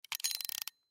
Kitchen Timer Wind, Timer Wind Small; Household; Blastwave

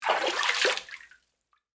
Water Movement Tub, Water, Movement, Large, Tub; Nature; BlastWave

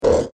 Robot On A Skateboard Flyby; science fiction sound effect; Robot Sound Effects

